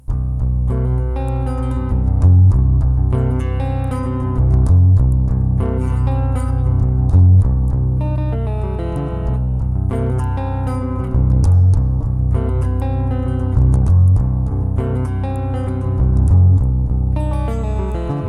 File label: acoustic; native instruments; guitar rig